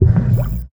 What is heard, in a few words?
menu big info shown